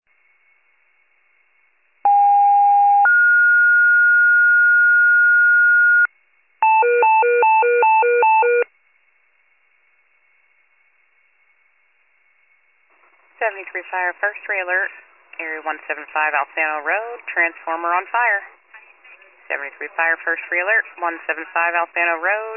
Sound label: fire, pager alert